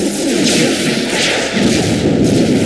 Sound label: DEATH STAR; East West - Global Sounds; STARS FXS